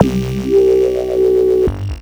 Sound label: Fuzzy Kick Voweler, Cwejman S1